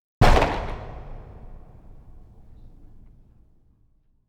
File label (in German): streichholz anzuenden, mit detonation; geraeusche digital